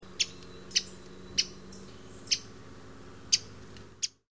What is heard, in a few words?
Ovenbird